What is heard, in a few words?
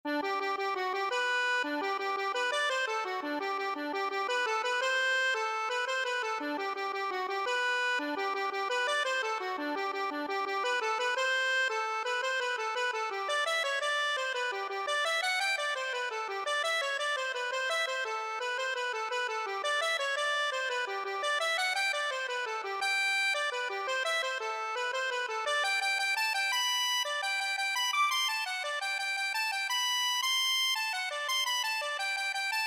gurtys frolics, accordion